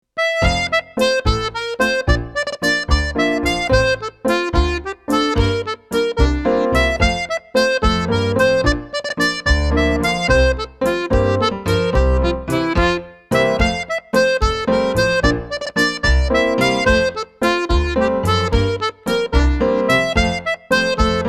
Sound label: Treble Jig